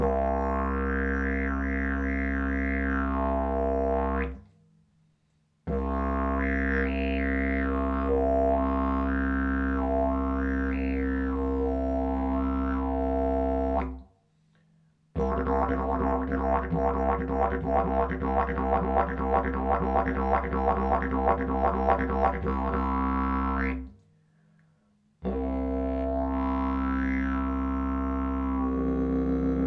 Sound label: didgeridoo; olse bojovnik